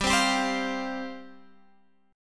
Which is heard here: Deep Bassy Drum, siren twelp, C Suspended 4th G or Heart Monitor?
C Suspended 4th G